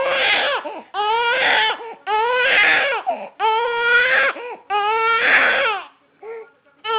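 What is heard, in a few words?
baby tired, female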